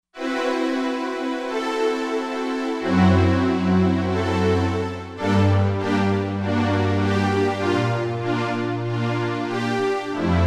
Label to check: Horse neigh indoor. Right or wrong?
Wrong